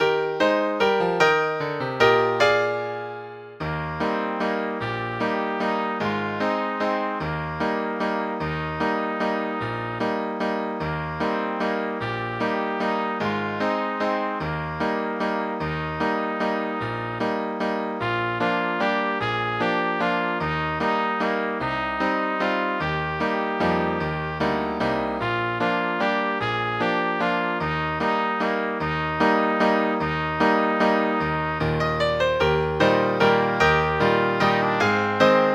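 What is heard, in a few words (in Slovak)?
Okolo Súče pre trúbku a klavír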